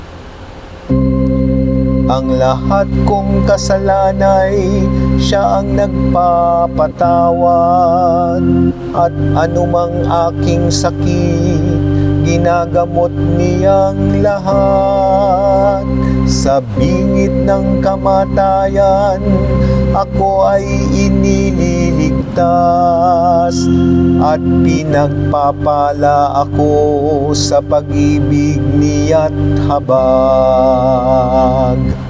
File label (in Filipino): Salmo; responsorial psalm